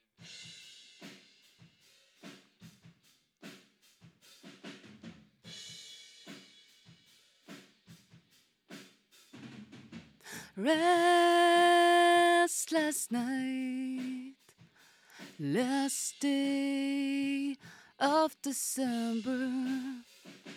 Vocal

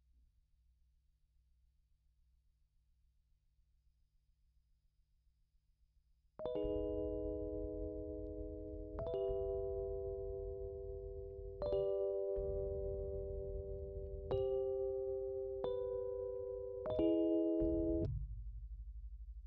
Rhodes

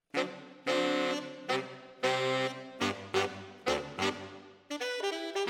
ORTF SCHOEPS, piano

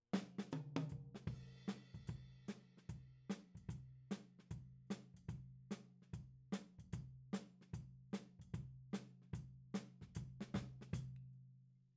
Tom

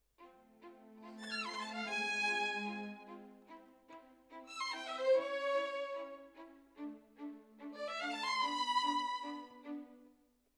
Violin Solo

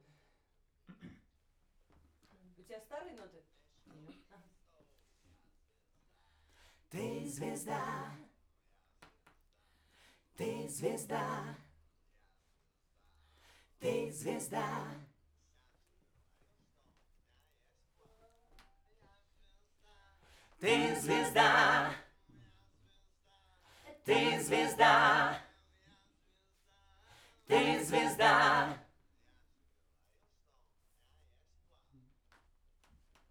Backs SCHOEPS

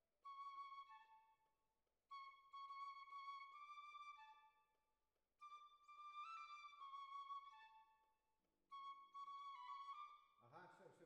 Violins Over

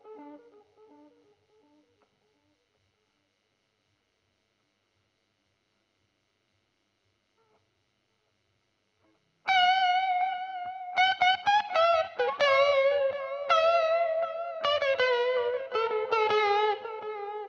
GTR Royer

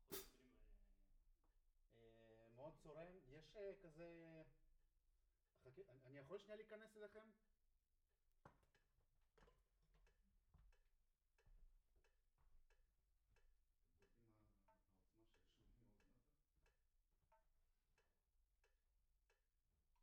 Room

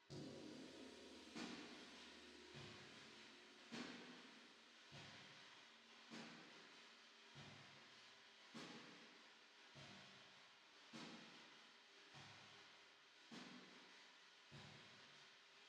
Drums Verb

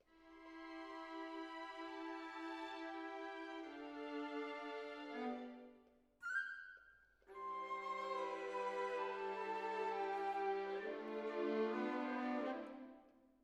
Cello Solo

